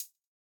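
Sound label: Cl Hat, closed hat; Grime; 135bpm; Zero-G Sound Sense Grime Scene; Construction Kits; A Positive Vibe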